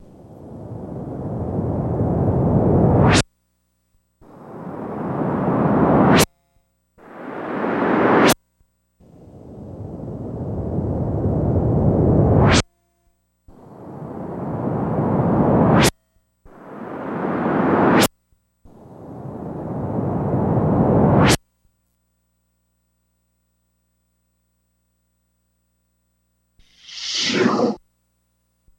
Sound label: Sweeps Flanges Comed, air